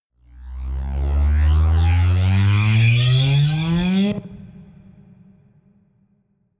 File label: sweep